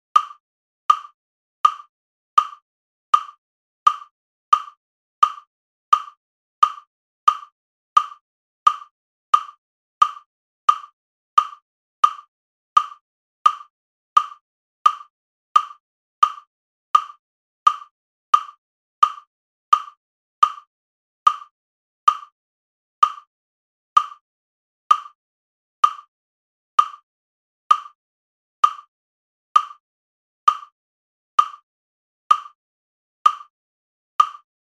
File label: metronome